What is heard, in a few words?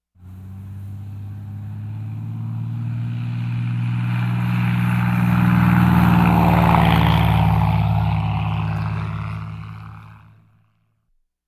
Propellor airplaine taking off; Technology